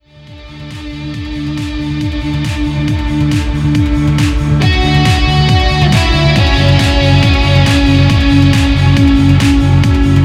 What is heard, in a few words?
Guitar Sample